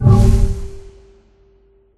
barrier off

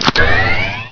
Belt Snd; Marisa Kirisame

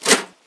vent open

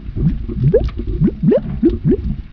slime; doomtest

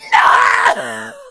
death

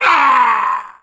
Death; Marisa Kirisame

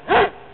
dog sight; wolfstuff; wolf3d

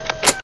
blaze preload; hellblazer

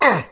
injur

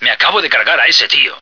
male slaughter

